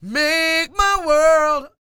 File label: SING, Vocal Planet Blues Soul BLUESY